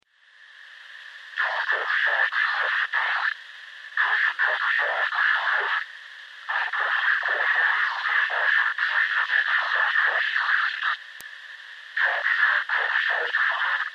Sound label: Communicator, static voices